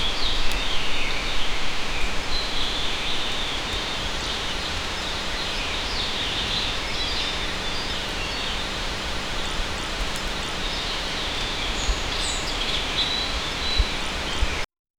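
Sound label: ambience forest birds rain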